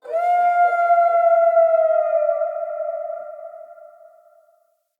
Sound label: Wolf Cub Howl Stinger; Vocals; Animals